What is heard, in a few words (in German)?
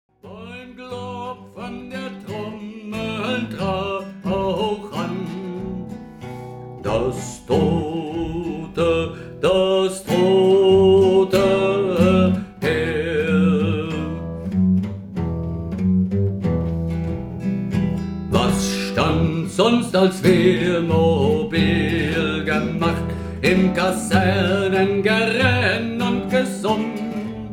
solo; lied eines tamburs 2